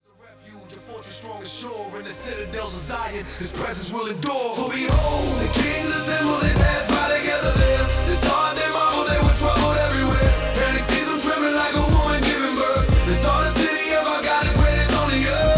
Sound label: RAP; Psalm 48 Glory of Zion